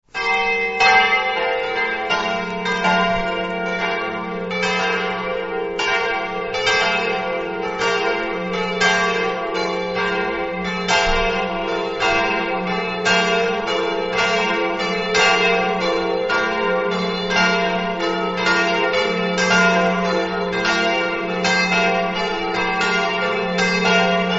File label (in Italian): avasinis, campane